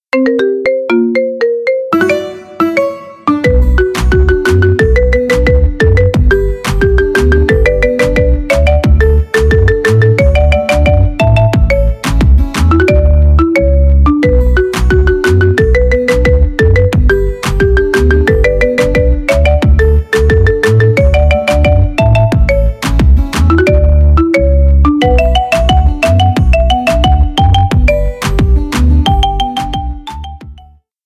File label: marimba remix; iphone